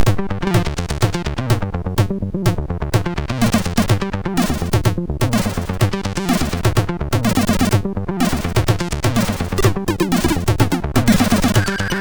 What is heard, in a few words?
heavy acid, MOS6581R2; hvsc